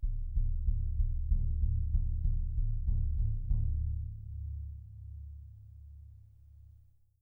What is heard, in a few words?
BD MI, Percussion; Miroslav Vitous - Percussion & Harp